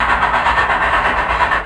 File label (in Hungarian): Mozdony; Pure Pinball